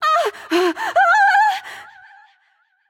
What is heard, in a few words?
Fear; bellona